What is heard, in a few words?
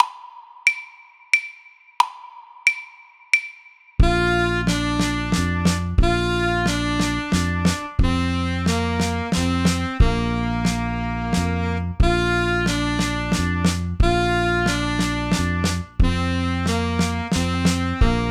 Cuckoo Winds